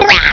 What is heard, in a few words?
puke